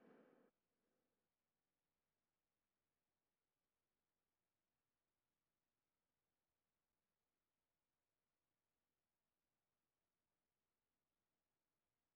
153 BPM D Min Guitar Low; Melody; Reverse; Loop Stems; Cymatics; 2022 Melody Collection - Reverse; Siege

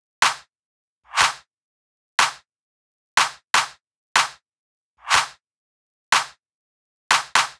Deep House 122bpm Clap; EDM Drums; Drum Kits; Stems; Ghosthack; Ultimate EDM Drums; Deep House Kit 10

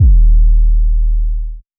808 D#, Basses; Cymatics; Eternity Sample Pack; 808s

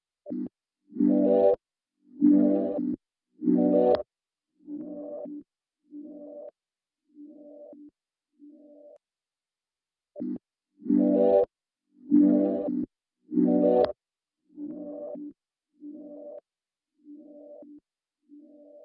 97 BPM E Min Texture; Melodies; Loop Stems; Cymatics; 2022 Melody Mystery Expansion; Sleepless Night

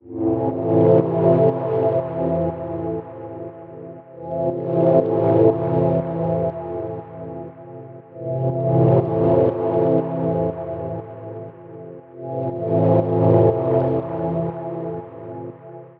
Pad Drone Loop Fmaj7 120, Dub Techno; Ghosthack; Dub Techno & Deep House Chords

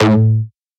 Techno Synth Shot A; Synth One-Shots; Ghosthack; 45 Free Techno Synth Shots 2025

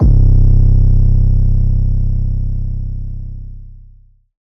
808 C; Advent Calendar - Day 9 - 808s

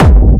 Techno Kick; 30 Techno Kicks 2025; Ghosthack

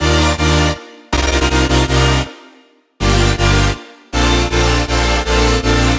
Future Bass Drop Loop 160 BPM G Min, Synths

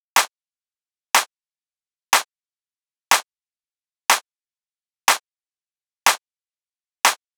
Claps, 122bpm A#min; Construction Kit; Ghosthack; Summer Freebie; Acapella Kit

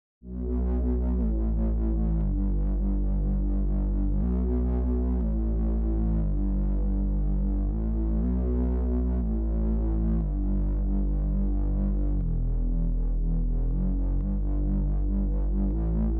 120 BPM B Min Bass; Loop Stems; Cymatics; Miami Guitar Pack - Pt 1; Ashes

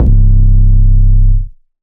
808 C; Hip Hop and Trap Freebie; 808s; Ghosthack

